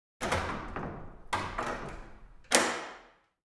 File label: Wooden Window Shutters; Inquisitor 2